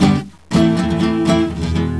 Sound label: Loop De Do, Total Spanish Guitar; Sony Creative Software; Crimson Blue and Fabulous Horncraft 4 RnB; Loop Samples